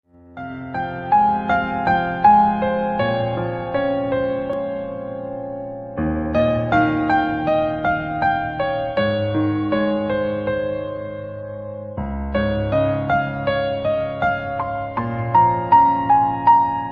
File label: instrumental background music copyright free music flute; aashiqui2 version